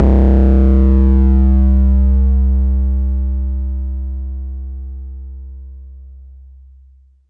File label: BD; TRX